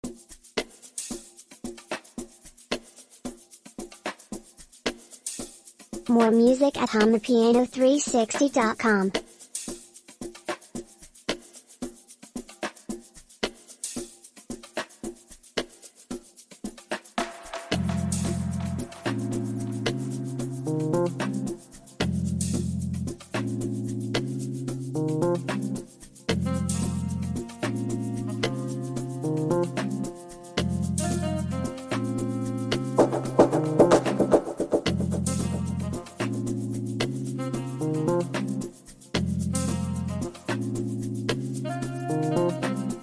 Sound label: Bells, amapiano